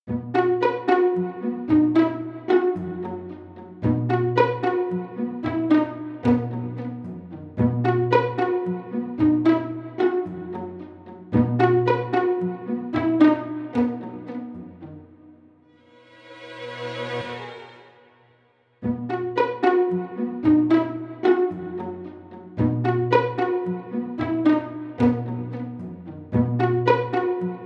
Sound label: bit menu